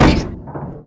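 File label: Board Hit; PC Gamer IT CD 48; trickstyle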